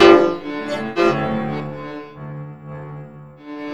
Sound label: STERNWAVE; USB Soundscan vol.33; AKAI; Disjointed Textures